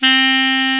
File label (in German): Klarinett; Alpine Volksmusik